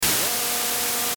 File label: static hum; Static Hum Ascend; Production Element Imaging Element Accent Transition; Blastwave; Static Sound Effects